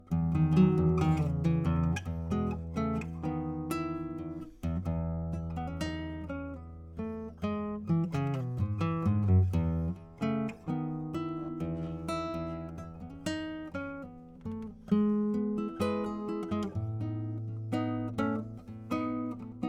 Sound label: nylon TLM 103